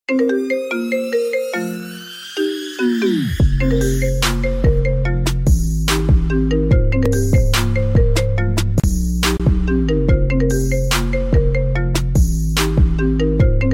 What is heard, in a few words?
iphone xs ringtone trap remix